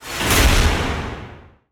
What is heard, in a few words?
Skill Start Magic; portal quest android; Dragon Lady Skill1